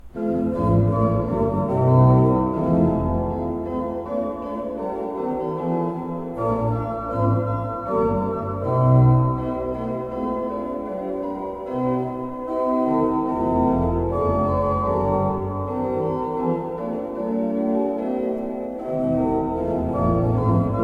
pipe organs; Schuke 30 III P

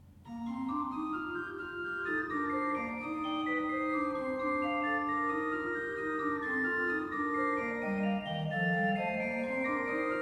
pipe organ; Le Raphael Multiplex; année de construction 2025

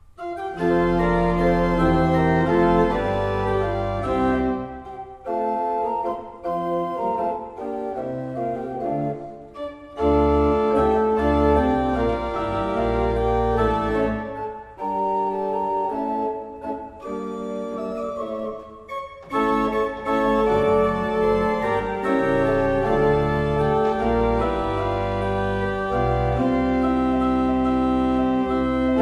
Rohlfing Kreienbrink, pipeorgans

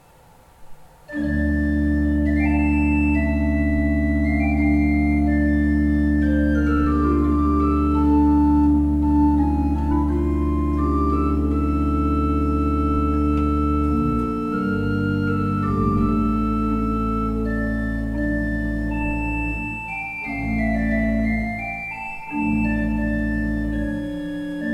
Ott, pipeorgans

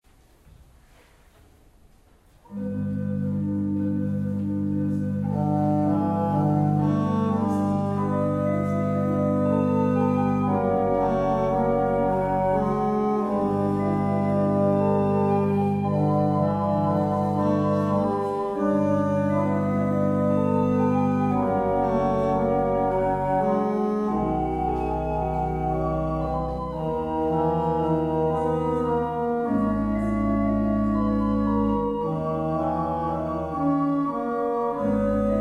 pipe organs; Hillebrand

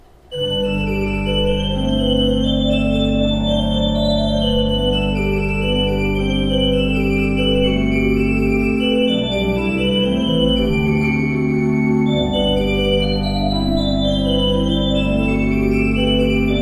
Ott, pipeorgans

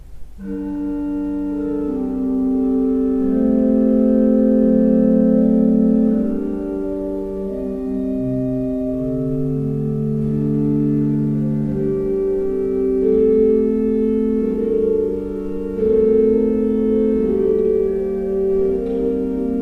pipe organs; Breil

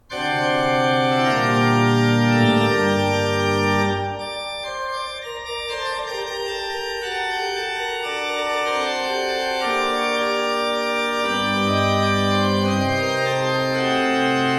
pipe organs; Paul Ott 11 II P

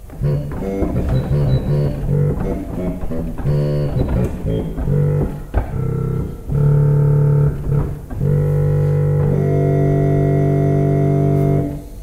Grenzing, pipe organ

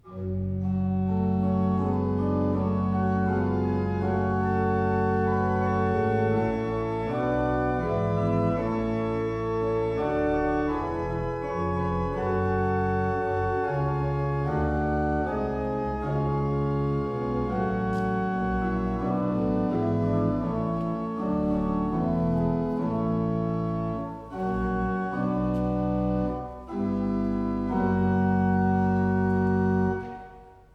Wegscheider, pipeorgans

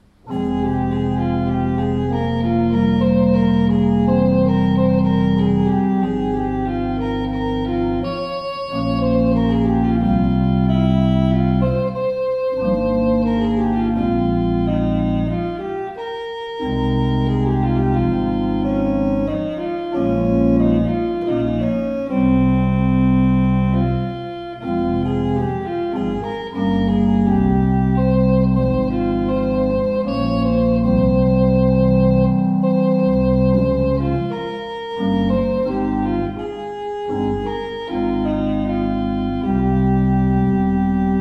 Walcker, pipe organ

